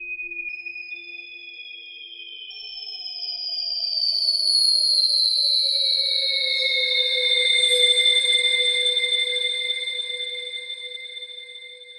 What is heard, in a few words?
Eerie Ambience - 120 bpm, Atmospheric; MIDI Clips; Tonal; Ableton; Factory Packs; Mood Reel